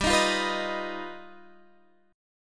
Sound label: G Augmented 7th, Guitar, Standard; G+7 strummed; x,10,13,10,12,11